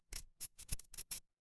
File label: retro game coin